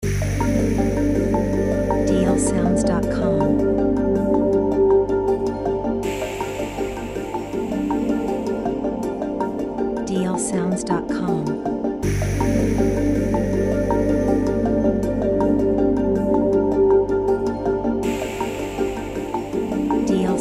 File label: Chill Resort Loop